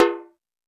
Toms, Drum Machines E-MU Pro-Cussion

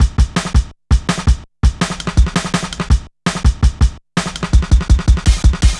Shuffling Live, Jungle Drum Loops; Zero-G Jungle Warfare 1, 2 & 3; 90 s Sample CDs

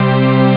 super string; ST-XX Amiga Soundtracker Sample Packs

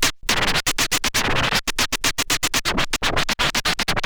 SCRAT; Best Service; Advanced Mega Effects

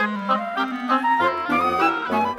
Winds, Orchestral; Sonic Foundry (Sony Creative Software); Orchestral VOL-2; Modern 18

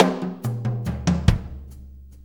6-8 Tom Roll, Perc Loops

